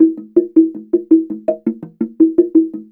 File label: SLIT DR PH, SLIT DRUM